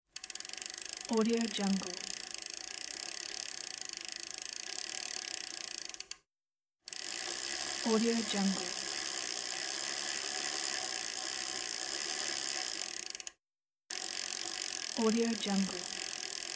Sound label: bicycle chain; mountainaudio